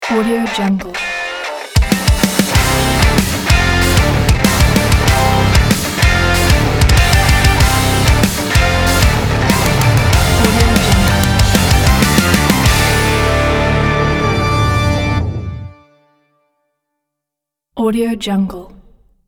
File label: energetic rock logo